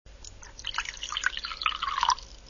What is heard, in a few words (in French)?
liquide versement verre